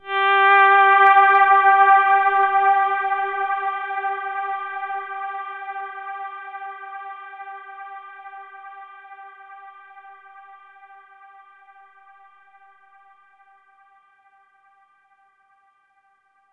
Overlay Tone; Act3 Downtown

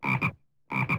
Frog Croak; Levels Hub Restaurant

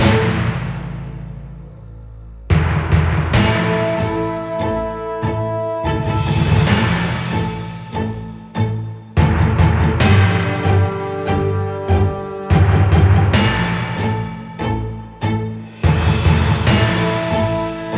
TENSION LOOPABLE

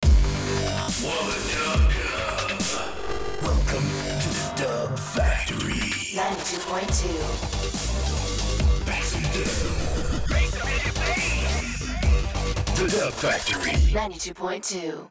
Dub Factory 92.2 (Jingle 2)

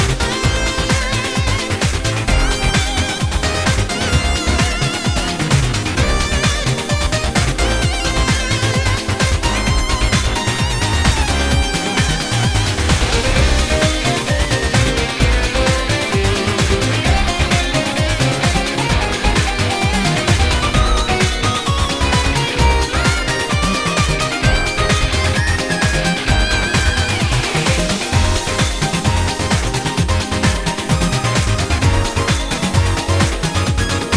Stage Loop

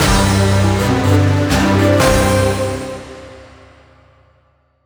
Win Dark; Citadels

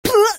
crash; Talking Tom Gold Run; Hank

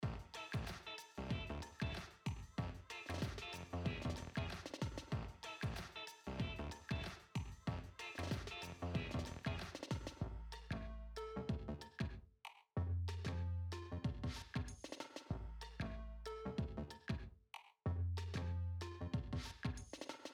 Music Shop; Equestria Girls

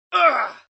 Assassin Dead

